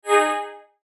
Chime High Tech; Chimes; Multimedia; Blastwave

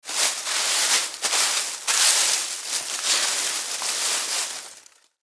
Leaves Gather Pile, Leaves Gather Pile Foliage; foley; Forests and Jungles; Blastwave

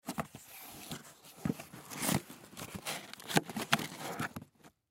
Cardboard Box Fold; Foley; Materials; Blastwave

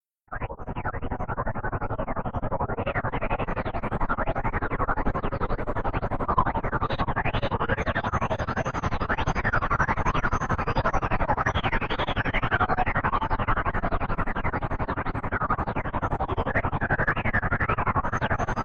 Cartoon Engine Warble Pulsing Element; Left / Right Futuristic Element; Scifi Science Fiction; Cartoon Sound Design

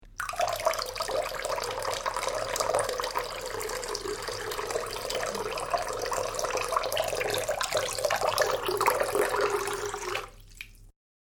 Running Water Sink Almost Full; Bathroom Sound Effects

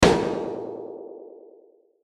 Hit Hollow, Hit Hollow Warble Reverb; Blastwave

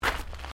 Footsteps Gravel Step; Crocs; Footstep Foley; Human

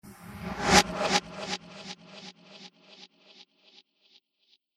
Magical Impact Whoosh; Whoosh Sound Effect

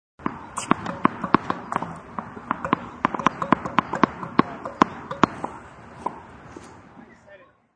Tennis ball bounces.; Tennis (Sound Effects:Sports)

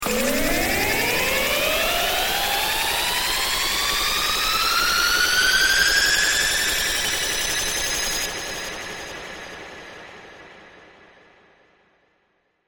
Hydraulic futuristic ascending lift; Tech

